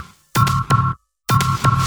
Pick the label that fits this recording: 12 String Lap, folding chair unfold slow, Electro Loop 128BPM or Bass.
Electro Loop 128BPM